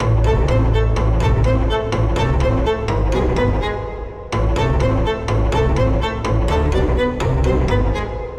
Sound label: Tense Cutscene loop; WrestleQuest